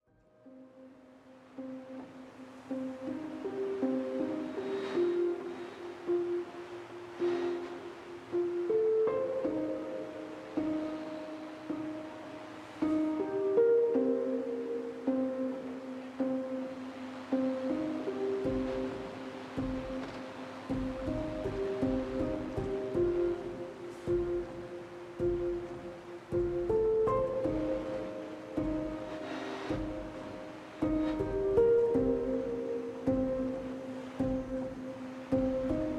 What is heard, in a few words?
five pianos